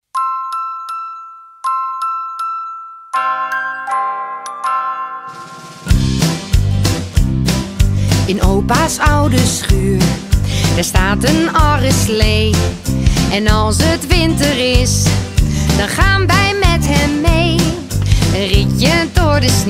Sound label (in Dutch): JINGLE BELLS Kerstliedjes